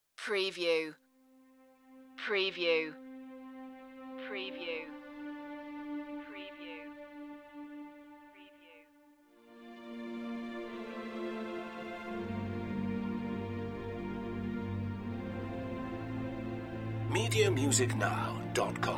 melancholy ride strings only

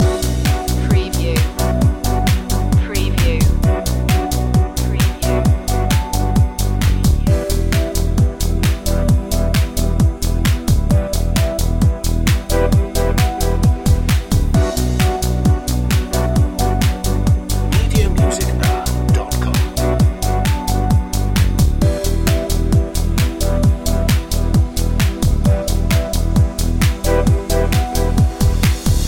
digital concepts loop

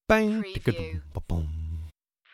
Male humming; beanstalk audio

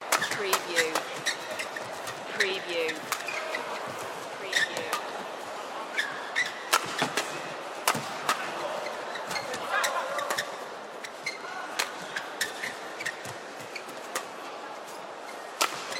Pro Badminton

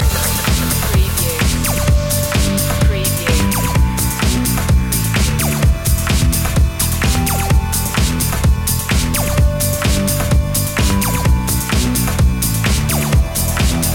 disco stu loop

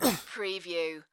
Pain Umph, Male Painful Grunt, Hurt

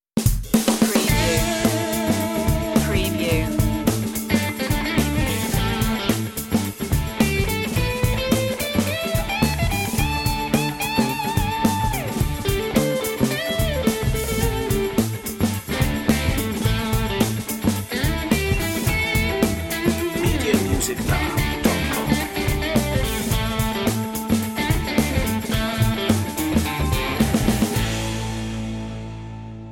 casino funk

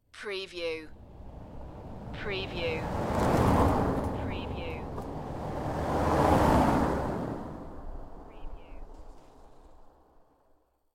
Two Cars By Gravel

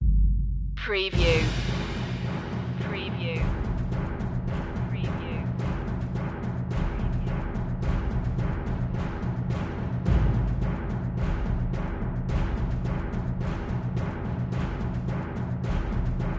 perc mix

